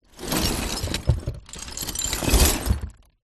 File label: foley broken glass in cardboard box tip movement